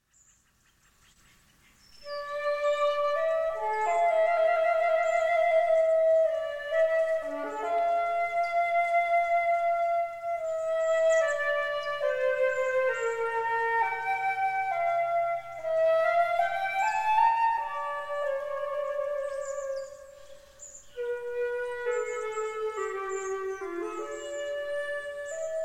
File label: Suite in D Minor, Sarabande; Suite No. 2; BWV 1008